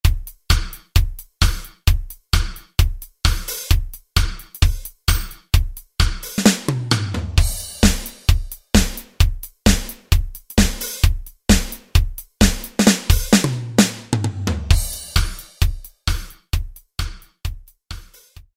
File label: Rock, Alesis SR-16; Preset Patterns